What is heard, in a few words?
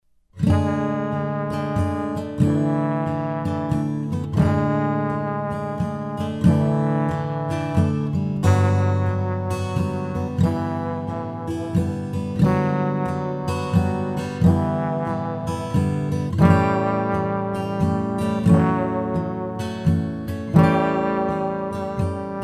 Waltz